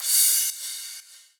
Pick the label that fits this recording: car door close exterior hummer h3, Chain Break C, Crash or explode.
Crash